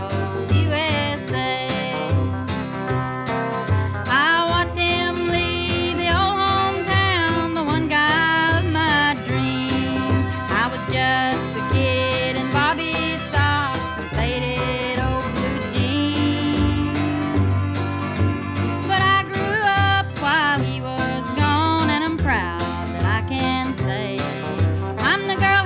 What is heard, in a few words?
country